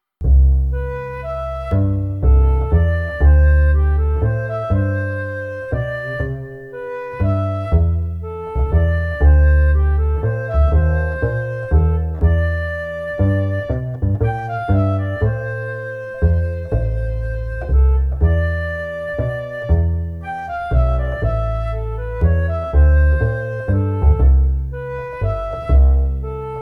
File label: Blowing Bubbles On The Roof